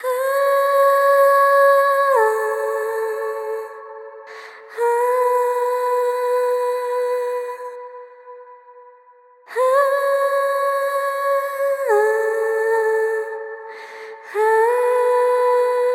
Vocal Swell

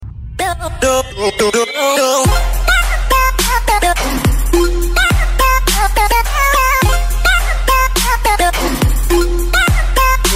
Bass

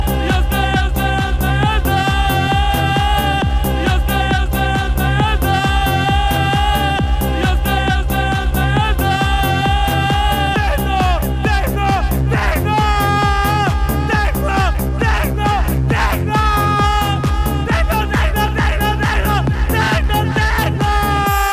Techno

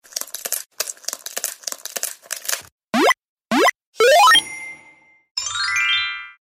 Crack An Egg

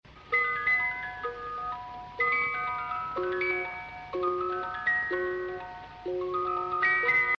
Old Music Box

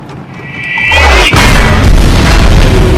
88mm kills tank

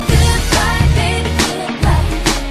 Melody

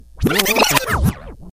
Record Scratch

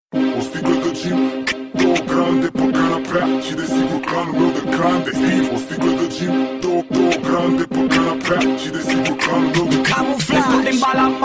Hip Hop

